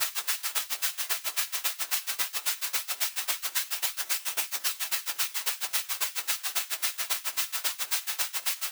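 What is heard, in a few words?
Cast Away Kit B, drums downbeat electronica; ударные и барабаны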